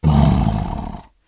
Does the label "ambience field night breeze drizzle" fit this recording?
No